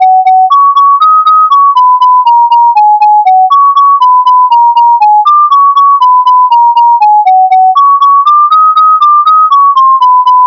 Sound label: twinkle again